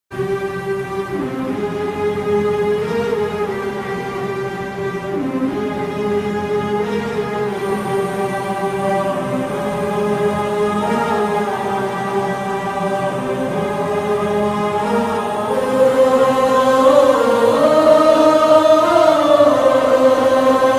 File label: climax bgm; Aayirathil Oruvan